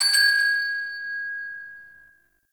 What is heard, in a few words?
Town Door Bells; Ambience; Warframe; Duviri